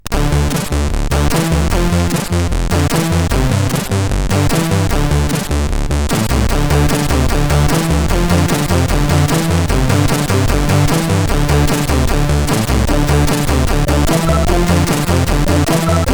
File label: tune, CSG8580R5; Tripler tune 5